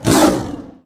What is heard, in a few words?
snork attack hit